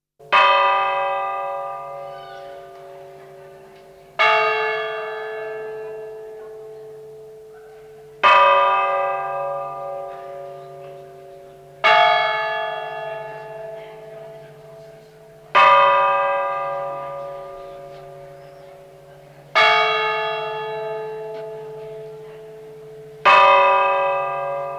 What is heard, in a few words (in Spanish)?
difuntos sencillo, campanas